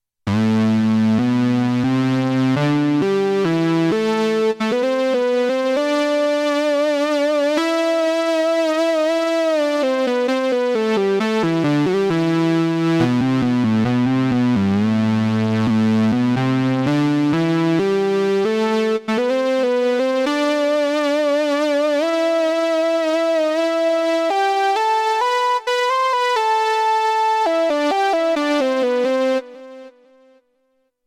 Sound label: lead